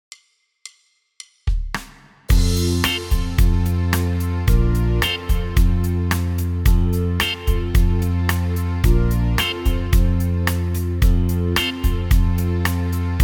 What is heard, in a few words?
G Boy Band Ballad; genos houseband